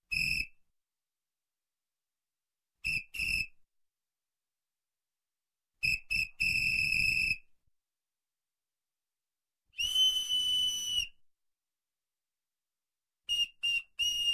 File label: Whistle, Toy toy Whistle; Sound Ideas 2000 Series